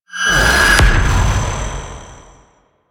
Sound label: Portal Teleport; Entrati Labs